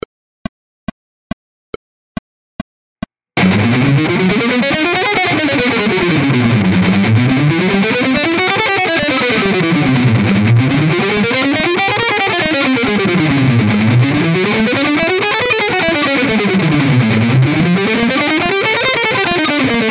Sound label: scales rhythmic variation